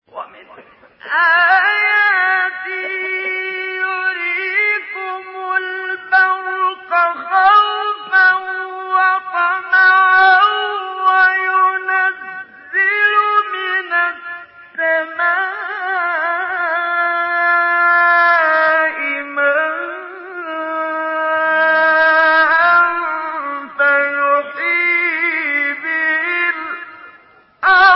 تلاوت مجلسی سوره روم; سوره روم آیه 24; محمد صدیق منشاوی

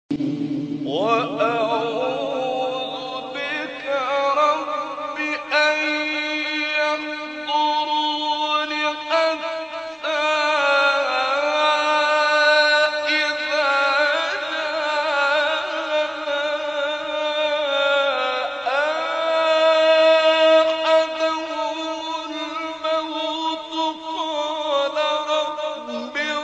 تلاوت آیات سوره; مومنون; ممدوح عامر

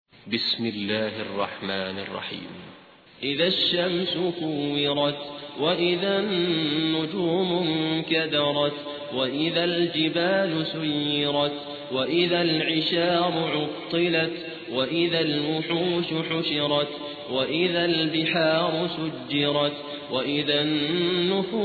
ترتیل قاری سودانی; سوره تکویر

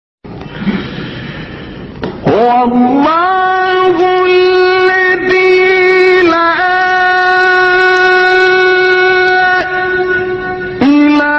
فراز زیبای آیه سوره; حشر

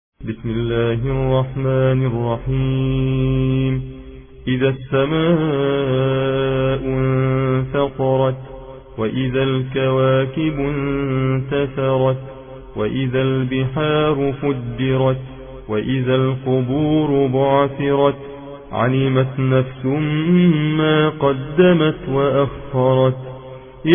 ترتیل روایت حفص عن عاصم; سوره انفطار

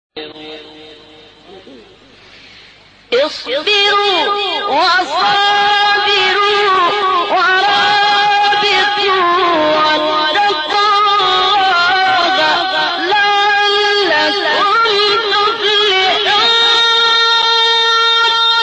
تلاوت قرآن سوره آل عمران; محمد اللیثی

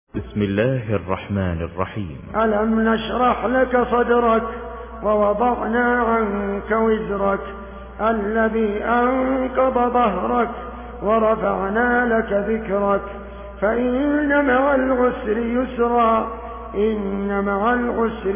ترتیل بروایت حفص عن عاصم; سوره انشراح

